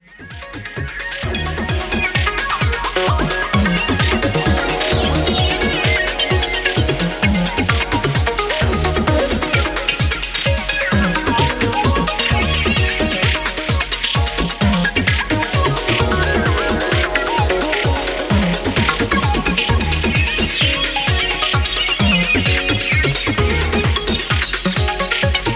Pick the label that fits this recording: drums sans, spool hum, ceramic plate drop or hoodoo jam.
hoodoo jam